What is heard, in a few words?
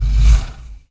SHUT DOWN